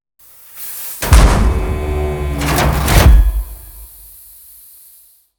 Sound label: scrape; activities planetoid